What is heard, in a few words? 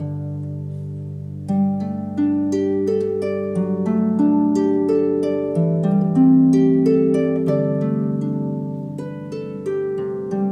harp